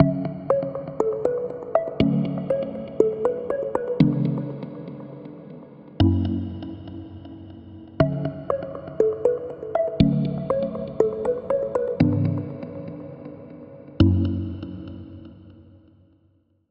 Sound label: Key; Routed Sound; Spire Expansion - Story Walker Vol.1